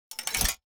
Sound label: gate down